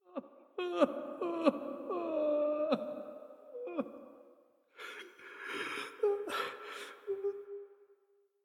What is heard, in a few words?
Crying; Prison Architect; Rouleete